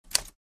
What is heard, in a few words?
Smith Corona 1940 vintage typewriter single letter